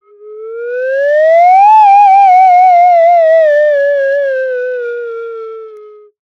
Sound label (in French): Fantome a la flute